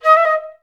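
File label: flutes D#4; Best Service; Peter Siedlaczek's Advanced Orchestra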